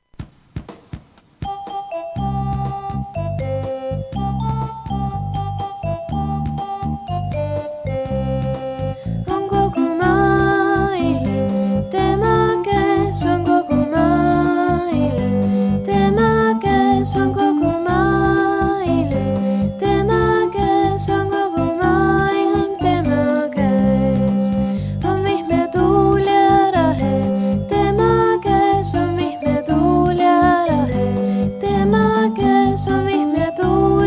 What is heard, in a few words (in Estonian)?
laul